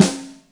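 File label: Vintage Brass, Yamaha DTXpress Acoustic Snare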